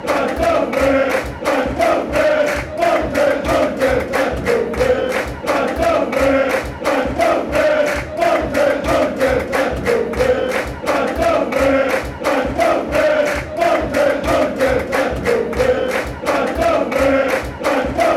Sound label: chant, fanchants; excelsior